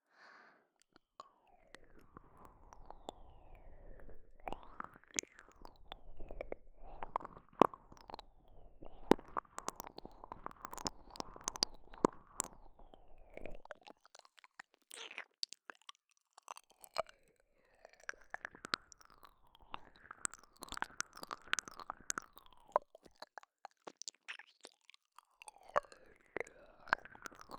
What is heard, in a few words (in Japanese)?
耳舐め